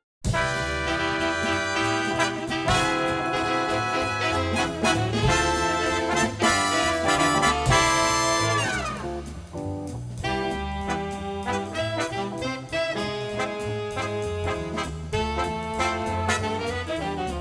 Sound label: big band